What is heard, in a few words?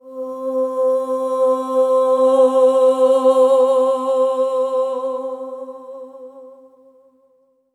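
SOP X2 C4 L, soprano; Spectrasonics; Symphony of Voices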